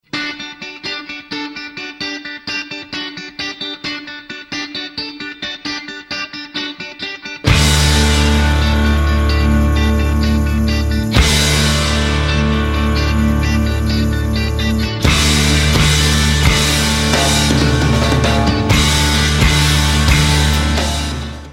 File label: rock tone